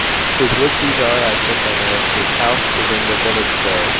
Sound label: noise